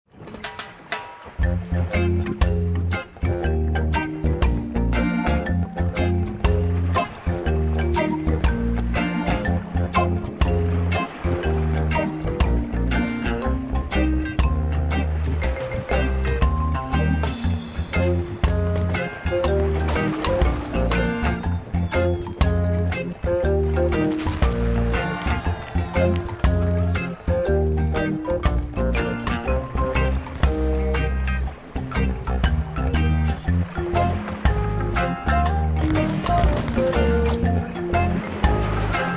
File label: Firing the Cannon; monkey island 3